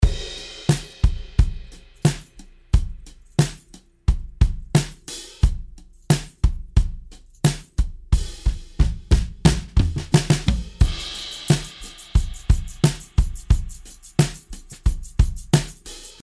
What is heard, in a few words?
Drums Studer Bright Norm; UAD Spark